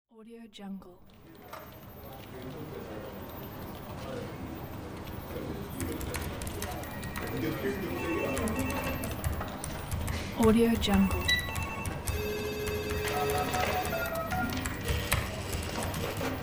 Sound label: quiet office ambience